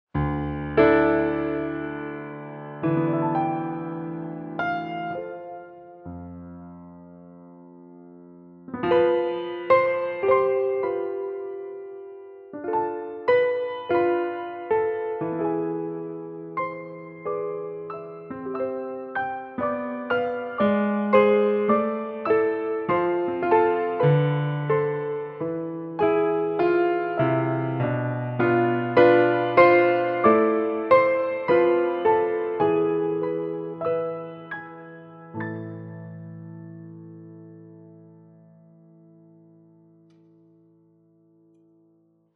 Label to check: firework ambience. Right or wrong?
Wrong